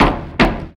Move; ycrpg

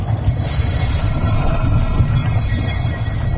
infuser

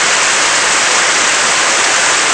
Storm; ycrpg; bgs

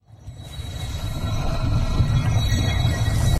infuser start

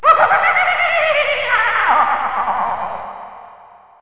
Devil; ycrpg

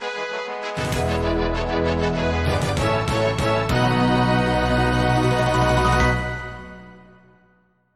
fanfare; ycrpg

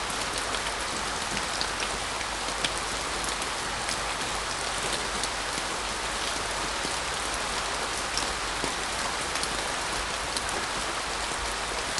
Rain; ycrpg

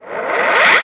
Wind

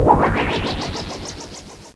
Pollen; ycrpg

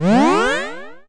Heal

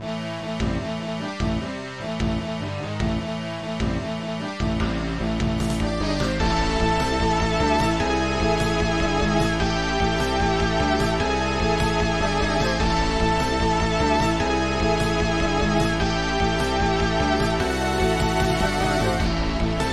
Battle, bgm

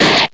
Slash

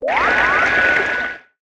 Water

